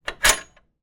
household gate bolt unlock movement

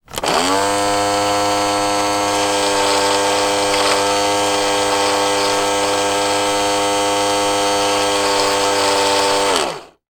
toy helicopter rescue winch motor down; sound effects one

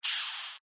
animals insect rhinoceros beetle hiss single

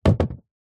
sport soccer ball down small fast bounces to stop

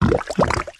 human fart bath water bubble; zapsplat

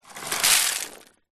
food popcorn spill onto wooden surface

